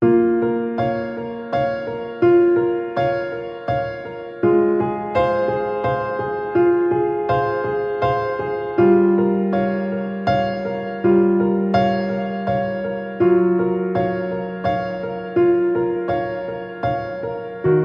Piano

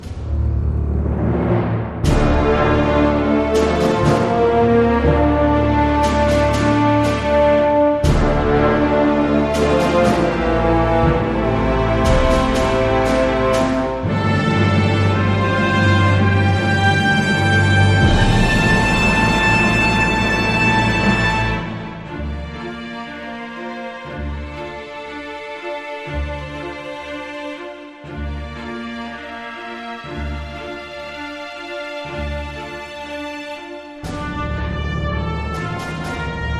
No Choir

